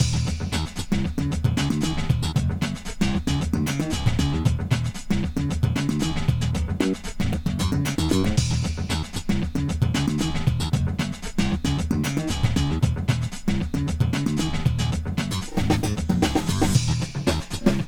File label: Groovy Untight Funk; ft2